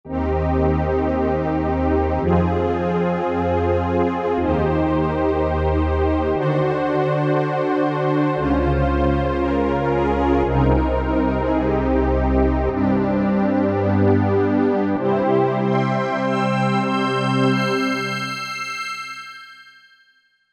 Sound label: Pul strings, Korg MSC-1S; Korg MSC-1S Variety